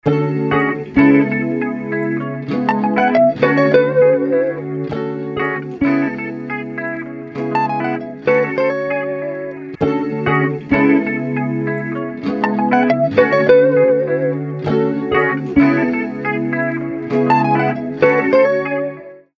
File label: MicroPitch Eventide Track Loop Nicechorus